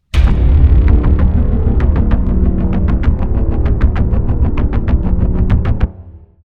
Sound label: trailer hits melody